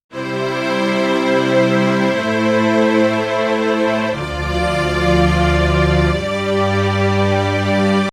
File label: strings sound